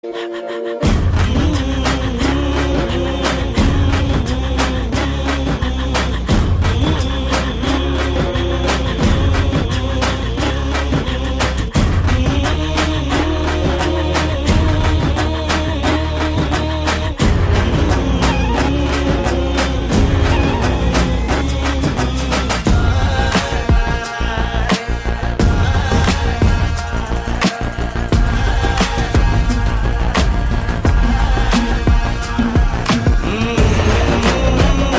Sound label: Pani Malayalam Movie Bgm